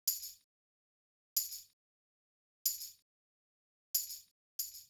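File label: Tambourine, Hip Hop Exotica, A minor; Big Fish Audio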